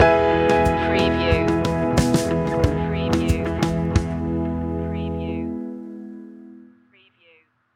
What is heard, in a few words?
rumble in the jungle stinger